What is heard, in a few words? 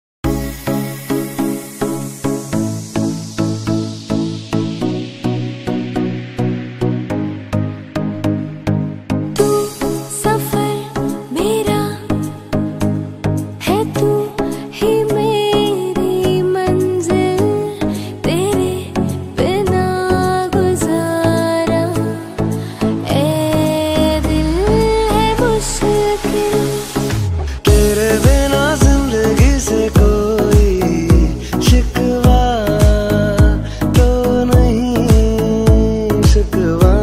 Modern Sufi Songs Mashup; Best of Bollywood Sufi Songs